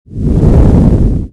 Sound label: thrust